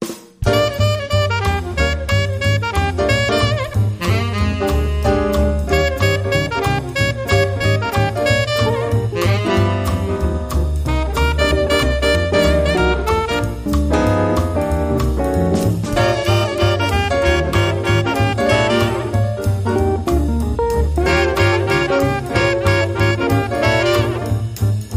Sax

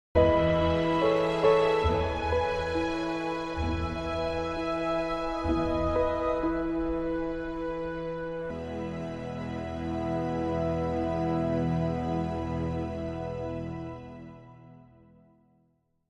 Bumper

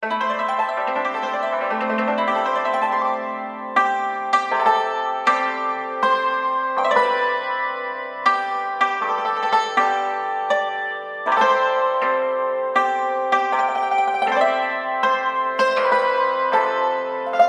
Yangqin Happy Birthday

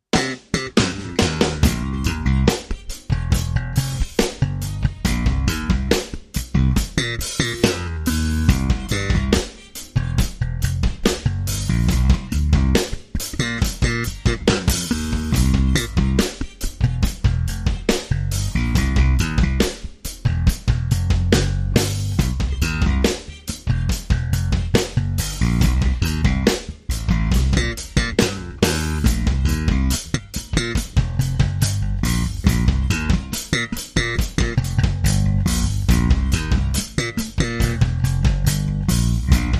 Drum & Bass

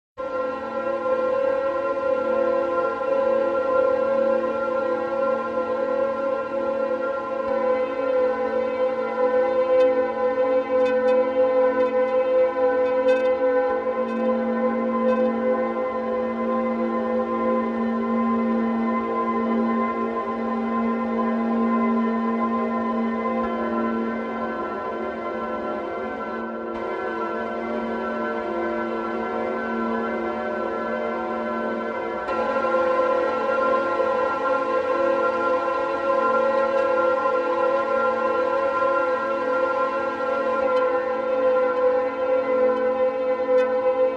Distorted Tone and End Rise

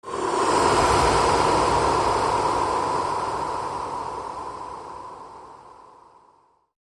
Transition Gentle Breeze

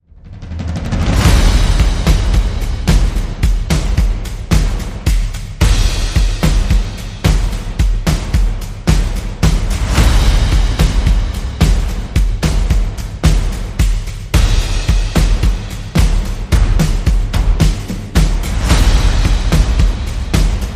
Percussion and Effects

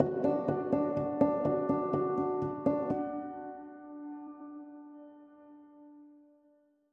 Bittersweet Contemplation, Bumper

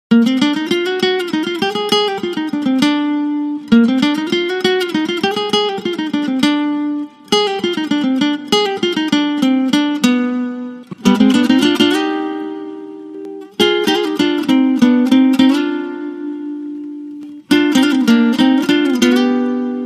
Guitar Only

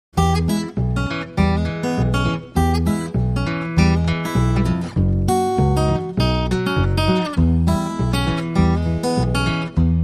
Ragtime Blues

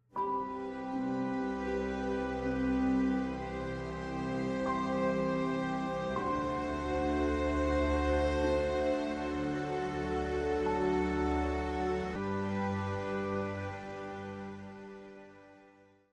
Soothing Waves Short

